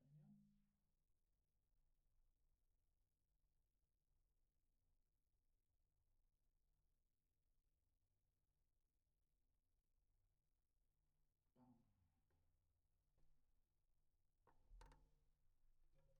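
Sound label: Snare Bottom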